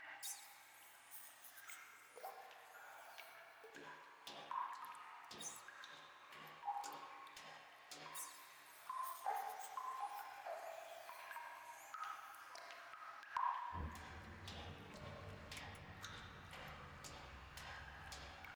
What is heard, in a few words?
Sewer